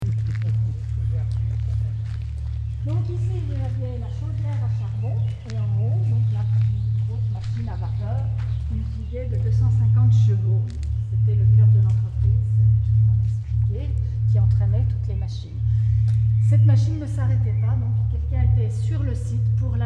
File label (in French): visite publique chaudiere